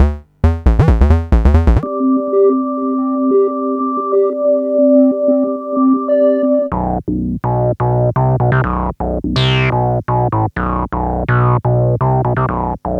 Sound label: Melody; FutureMusic